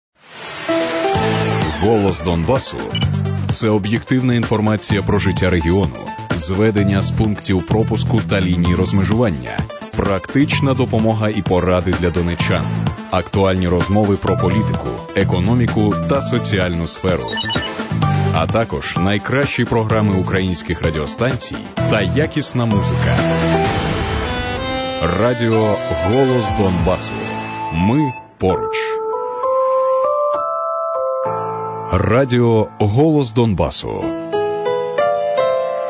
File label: interval signal; ur vo donbass